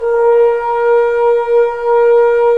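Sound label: brass trombones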